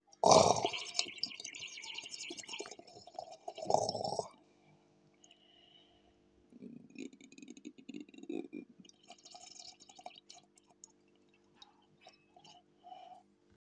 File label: growling